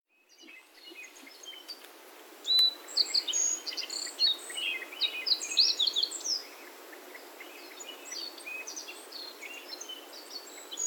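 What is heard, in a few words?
Black-faced Bunting; yaita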